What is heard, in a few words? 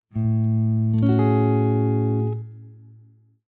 A maj 7 add 13, guitar; A Maj 7 add 13 no 5th; extend the initial Major 6th sound by flatting the 7th; triad substitution